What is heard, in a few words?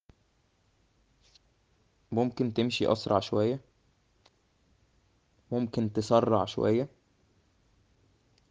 traffic